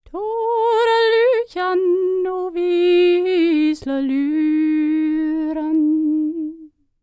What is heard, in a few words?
Nord, World Voices; ILIO; Vocal Planet VOL-5